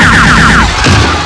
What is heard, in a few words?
pain; quake2; martian